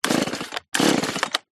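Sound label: industrial chainsaw starter cord pull x2; zapsplat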